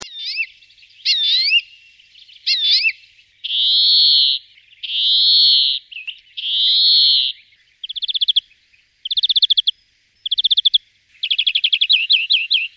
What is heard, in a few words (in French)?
verdier; le verdier d'europe chant 03